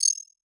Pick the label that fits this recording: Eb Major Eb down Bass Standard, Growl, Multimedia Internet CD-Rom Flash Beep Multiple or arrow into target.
Multimedia Internet CD-Rom Flash Beep Multiple